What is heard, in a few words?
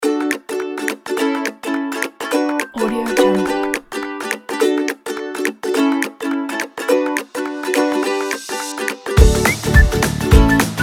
happy